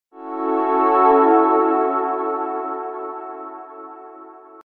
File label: Ringtone (Sweep), Pads; Crowdsourced Sample Pack